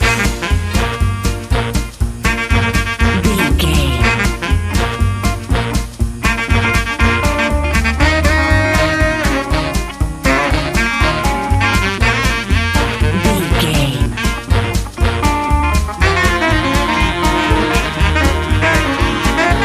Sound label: rock n roll jive 120 bpm loop